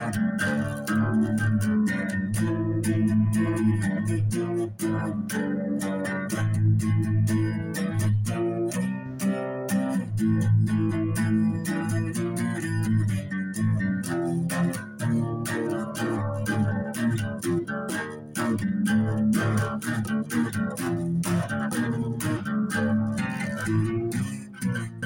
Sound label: guitar